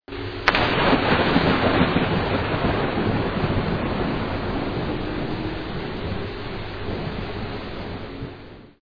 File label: thunder